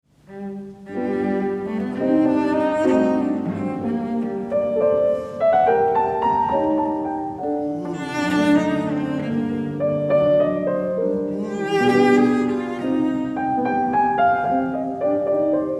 Cello Sonata in G Minor Adagio sostenuto ed espressivo; Beethoven; Op. 5 No. 2; Allegro molto